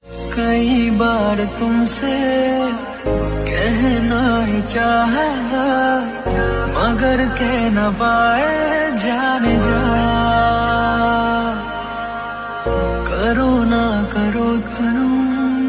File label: Love Song Ringtone